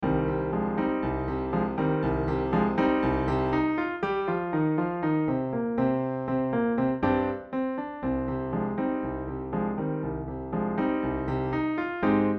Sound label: pno